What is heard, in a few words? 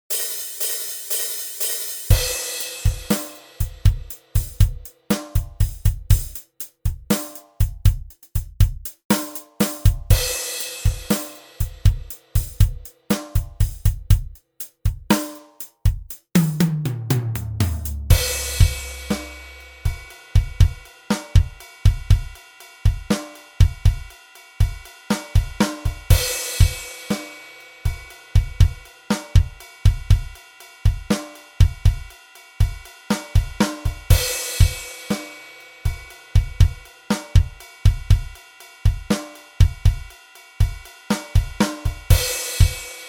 Drums Simple Rhythm Drums